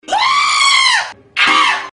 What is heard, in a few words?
aaaaarghhhhhhhhhh aaaaaaahhh